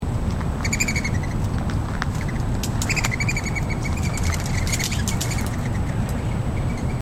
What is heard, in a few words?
mourning dove